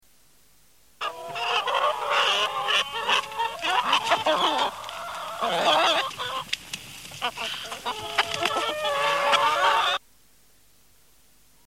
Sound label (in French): basse cour